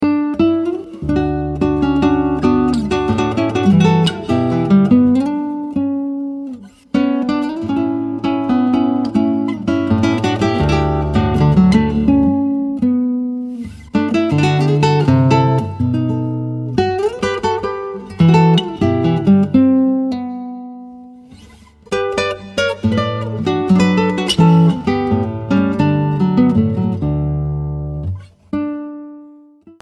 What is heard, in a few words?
Scherzino Mexicano